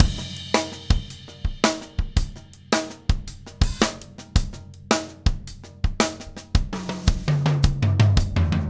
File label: TRIP, 165 TRIPLETS; HI BPM; Steve Gadd Drumscores